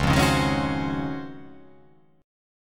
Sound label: C sharp Major 11th, chord